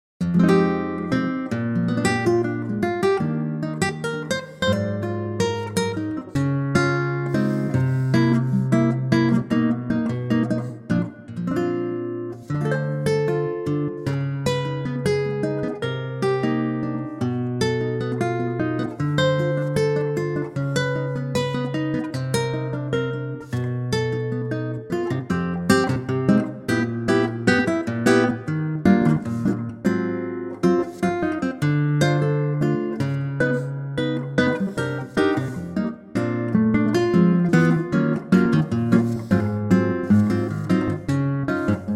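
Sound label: Bb acoustic guitar; key - Bb - vocal range - Ab to Eb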